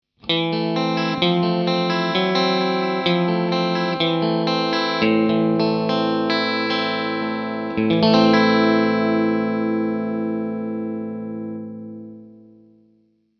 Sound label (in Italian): Clean bypass, Suono pulito, senza effetti, solo chitarra e amplificatore, Fender Stratocaster; pickup al ponte + centrale